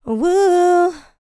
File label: LICKS, Vocal Planet, R&B; ILIO; Dance; LT R+B